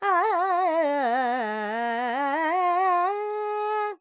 Dorian, Vocals Carnatic Female Alaps 70 G Dry

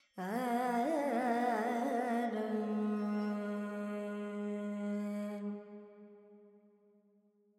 Verb Dorian, Vocals, Carnatic Female, Alaps, 70 G; Sample Banks

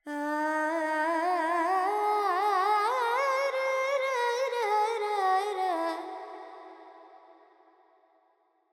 FX, Vocals, Carnatic Female, Alaps, Charukesi

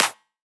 Clap, Percussion; Sample Banks

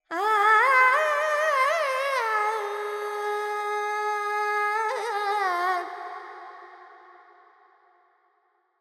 FX Purvi Kalyani, Carnatic Female Alaps

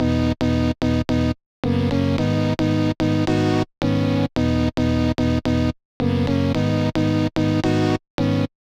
Keys Great Intro 110; Sample Banks; Melody; Keyboards

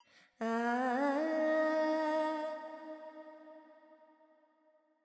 FX Dorian, Vocals, Carnatic Female, Alaps, 70 G; Sample Banks

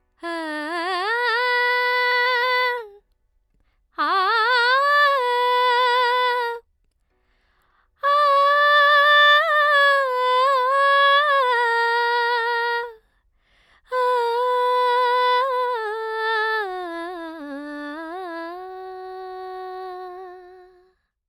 female vocals dry 4-4 Bm 100; Sample Banks

